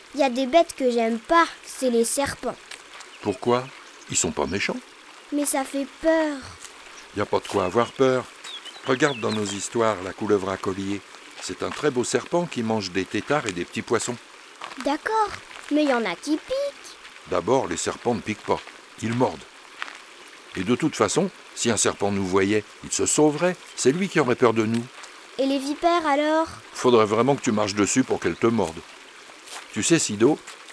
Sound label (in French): Une balade au bord de l'eau La couleuvre a collier; Studio les Trois Becs